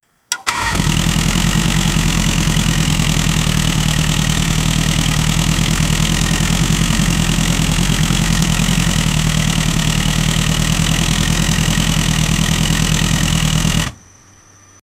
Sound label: v12 engine 24 liters 700hp, roaring